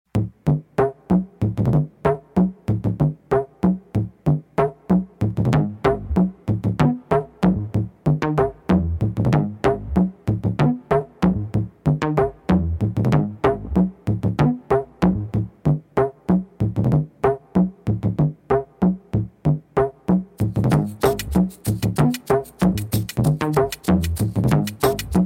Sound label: Toms Groove